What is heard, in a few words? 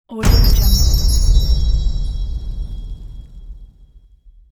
christmas fire flash